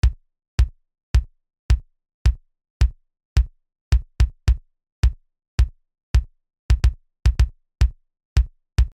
chorus kick; boss music

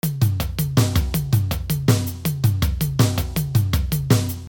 stem verse drums; boss music

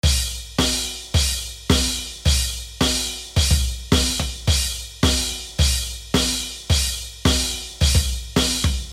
chorus drums; boss music

